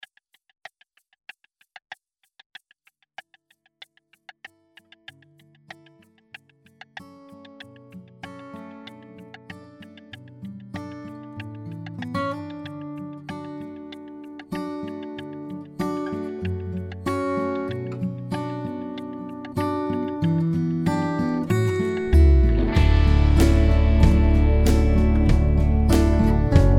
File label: lap steel open G6 backing track